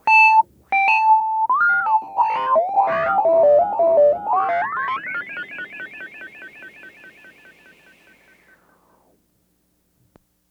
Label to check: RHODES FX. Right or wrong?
Right